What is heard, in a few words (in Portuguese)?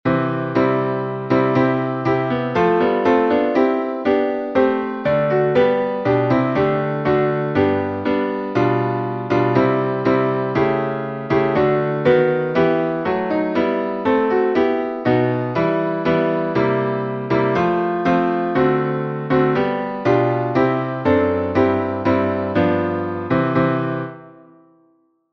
salmo playback